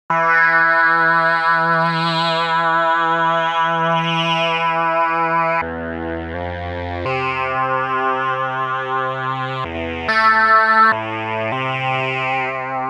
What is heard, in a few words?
Ultra Analog